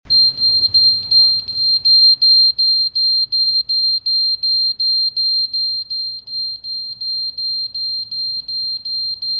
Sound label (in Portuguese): SINALEIRA; MAXXI